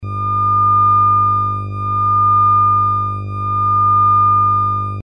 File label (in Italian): battimento; Elettronica